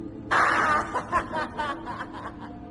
Gruesome Giggling

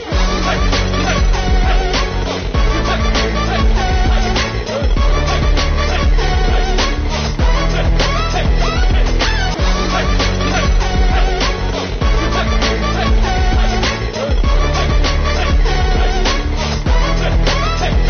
bgm

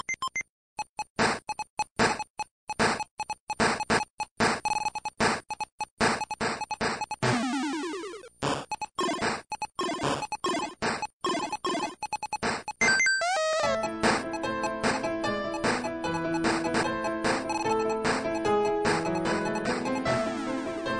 Tetris Drop

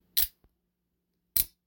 Automatic knife

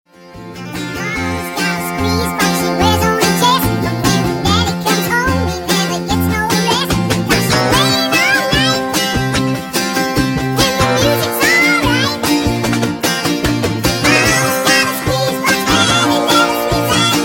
Squeeze Box

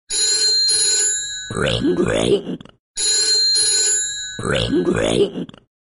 Burping

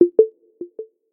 Charging

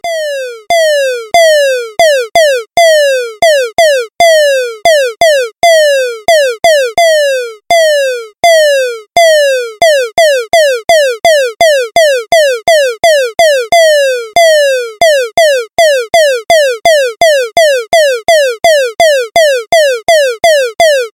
Super Clock Alarm

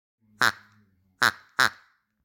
Short duck call

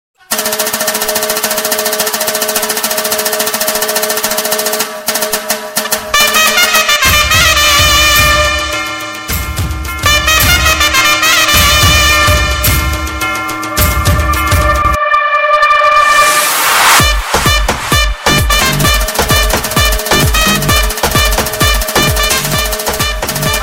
Dhol; Ipl4 Vs

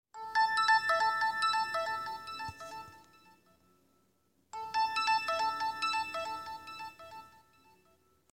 Ringtone; Sprint

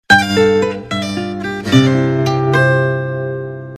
Nokia Guitar Remized

